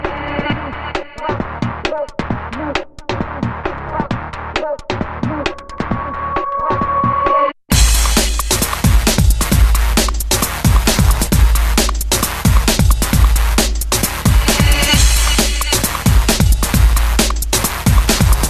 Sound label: High Beats